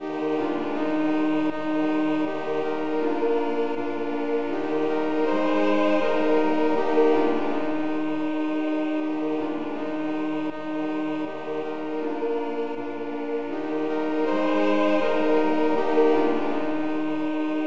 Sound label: polyinstru; hymns; Himnario grande